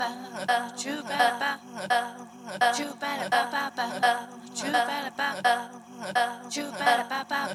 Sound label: break